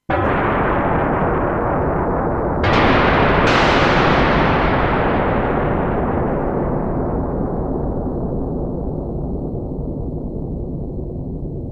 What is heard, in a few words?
SFX Thunderon